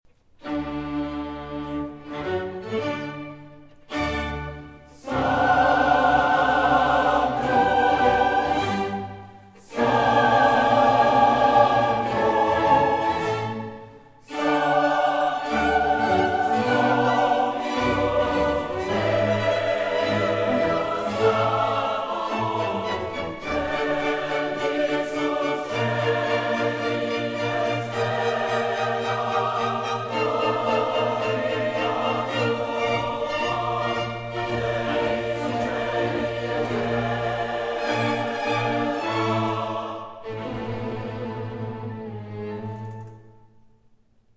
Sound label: chamber chorus mass g major sanctus